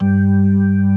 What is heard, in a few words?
HAM G2, KEYBORD